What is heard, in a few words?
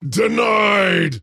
deny pain; Axe